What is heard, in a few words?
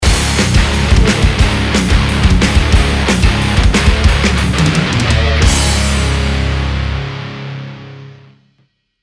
metal logo